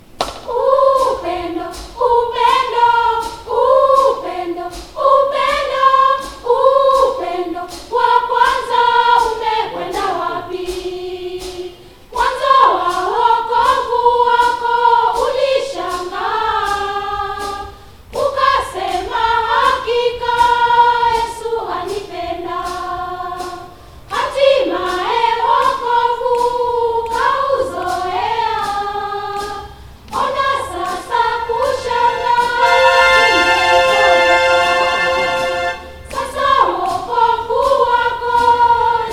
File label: Upendo soprano, choir; harmony